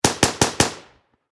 Warfare Machine Gun 7.62 Caliber M240B Four Shots Distant Perspective; Machine Gun Sound Effects